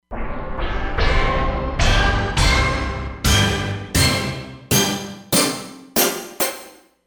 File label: Mix Hit